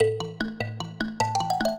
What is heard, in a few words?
minuet, mbira; Mozart